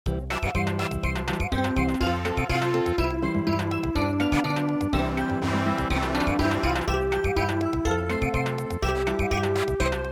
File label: Samba, traditional